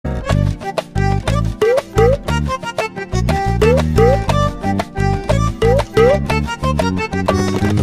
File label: Bgm Tone